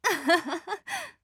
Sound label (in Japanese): アッハハハ